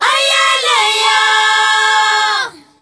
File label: ZULU CHR, FEM. CHOIR